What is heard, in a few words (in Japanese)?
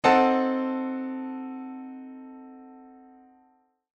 ガーン; 場面切り替えや心理描写; 演出効果音